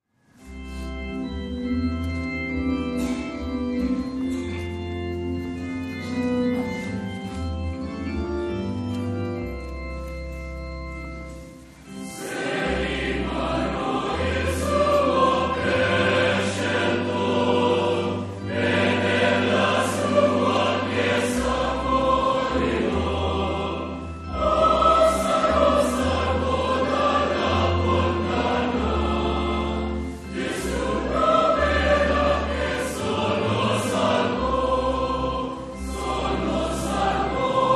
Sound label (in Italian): Inno religioso Coro della Chiesa Evangelica, Filippine; Son salvo; Igreia ni Cristo